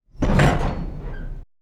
metal impact, heavy metal door

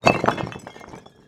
brick drop

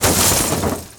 debris crash

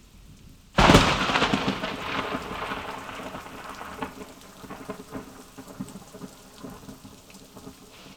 rain thunder

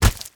body fall; splat

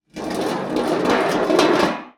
obj drop roll metal bin

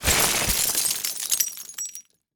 crash rock glass; small rocks and glass debris crash

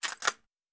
gun fast reload, cylinder

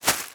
plastic bag, stretch

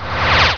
HOLOGRAF, laser swish

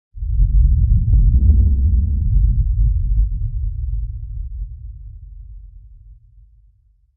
deep rumble

